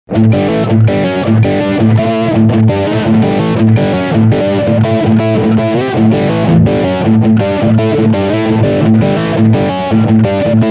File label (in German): Riff Distortion Bridgehumbu